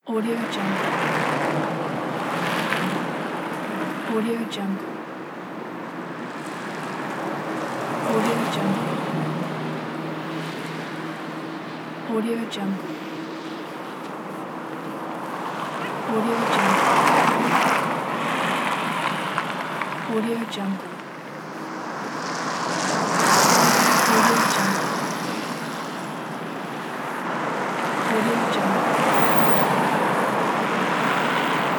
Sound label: traffic noise on a winter road